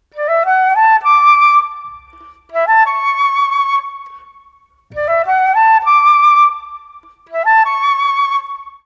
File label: Flute, Orchestral Series Rock and Pop; Sonic Foundry (Sony Creative Software); Orchestral Series VOL-4 - Rock and Pop